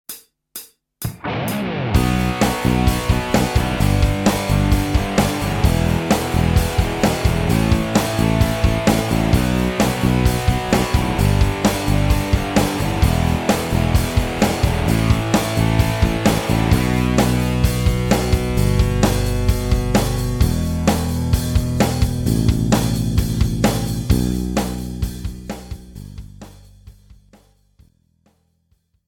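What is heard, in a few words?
octaves backing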